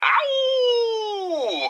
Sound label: awoo